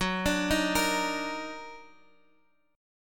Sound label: Eb Minor Major 7th Gb, chord